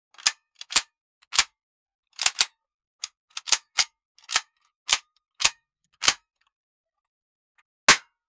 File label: gun